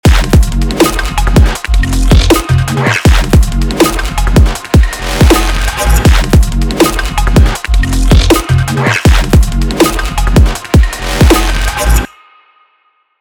sidechain off

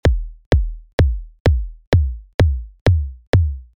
kick; p decay

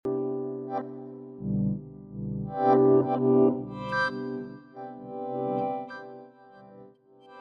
piano; portal

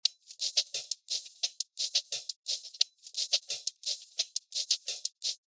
hat; regroover

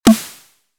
snare reverb

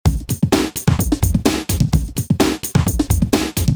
original kick

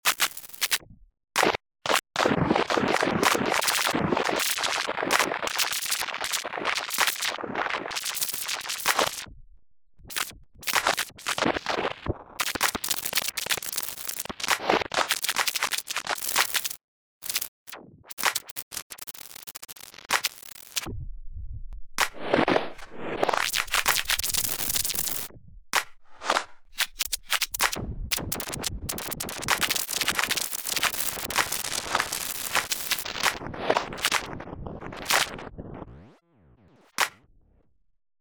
pitchhack; Ableton Live; Creative Extensions

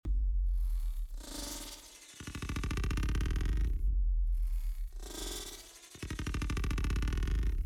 drum; portal

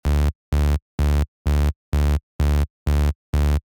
saw r bass

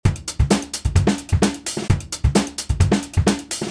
break; Arcade

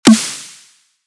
snare original

